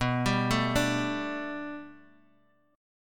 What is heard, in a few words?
B-Minor 7th Sharp 5th B down Guitar Open G